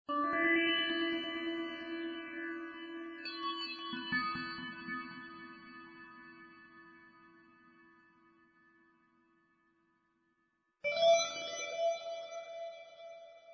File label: Menu atmo